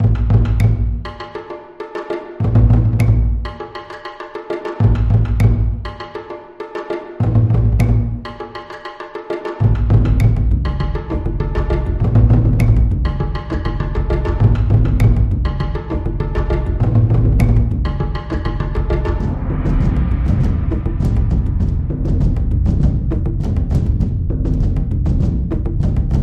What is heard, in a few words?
Japanese Tempo